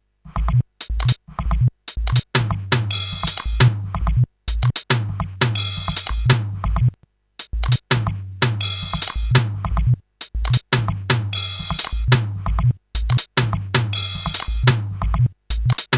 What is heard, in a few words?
stereo beats loop